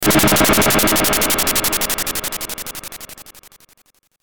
Hit Static Chopper; Blastwave

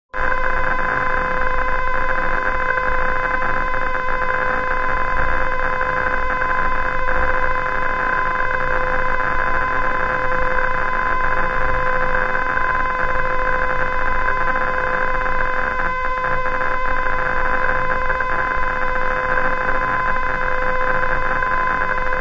Dead radio channel; Electrical; Sound Design

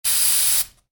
Spray Can: Spraying; Spraying Sound Effects; Spraying (Sound Effects:Objects); Stuart Duffield

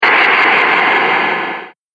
Heavy Blast Impact, explosion; Bomb Sound Effects

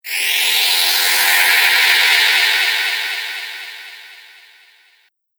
Electric Stardust, magic; Magic Sound Effects; Sci-Fi Sound Effect

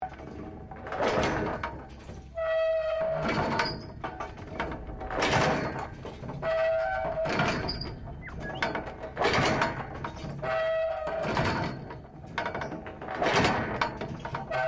PLAYGROUND METAL SEESAW OPERATING LOOP; Various playgorund equipment; Playgrounds

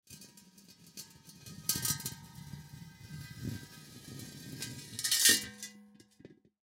Propane Tank Drag; Propane Tank Drag On Cement; Machinery; Blastwave; Foley

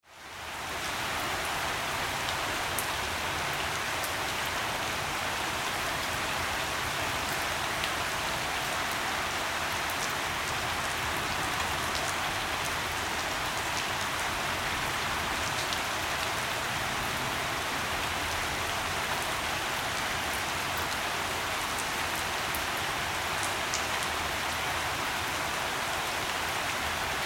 Weather Rain Pour Ambience

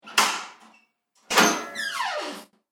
Door Open; Miscellaneous Door Sound Effects

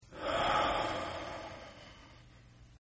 Sigh; Exhaling; Sound Effects:Voice; Partners In Rhyme

